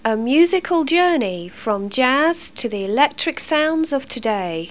jazz; Nautilus CD Magazine; mpc

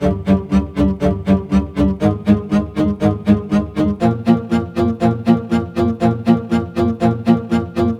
Synt; SYNTH; 120 BPM

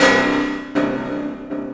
Piano perc; SpecOps2MPlayerDemo; Music

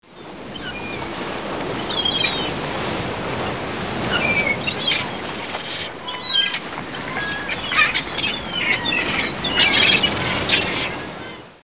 BEACH; TOYOTA

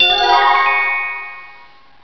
wand

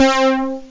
juno b67

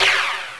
Paddle Fast; PowerPong

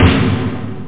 alt snaredrum; snare samples

